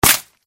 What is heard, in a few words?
platfrom